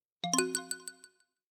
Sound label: Stretch